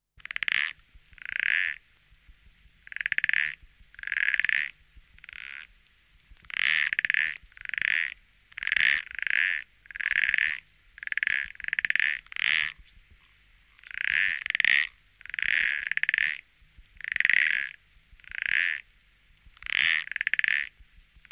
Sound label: rana frog; animali; rane